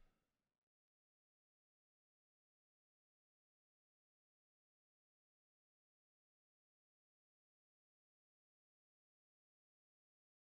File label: bass